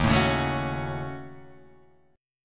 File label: D sharp Minor 7th Flat 5th D sharp down Guitar Half Step Down